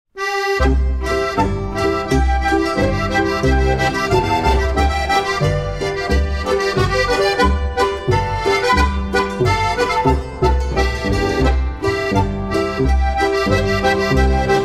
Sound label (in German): Boarischer; Weinglasl